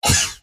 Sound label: cough; little horn